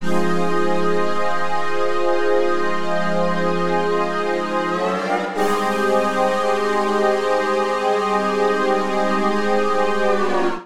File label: Encryption Pad F Minor 90 bpm; MIDI Clips; Tonal; Accompaniment; Ableton; Factory Packs; Chop and Swing